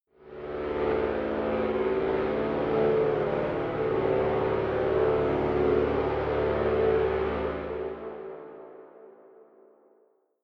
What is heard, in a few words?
space menacing alienation; raumschiff